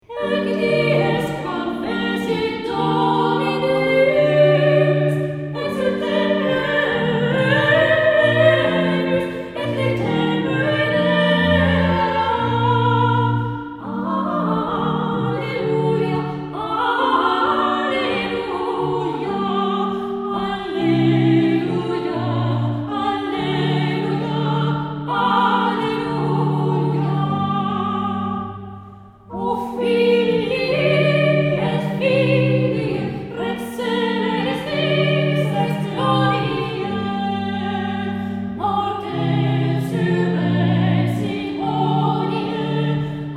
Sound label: motet; french music